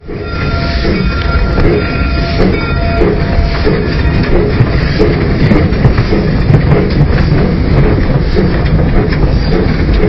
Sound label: starting Up